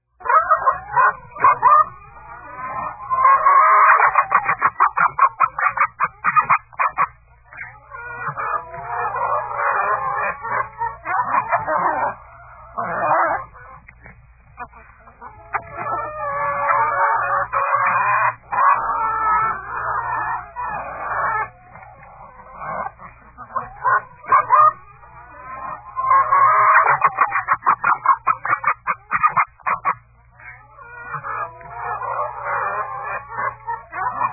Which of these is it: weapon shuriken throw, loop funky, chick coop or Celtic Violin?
chick coop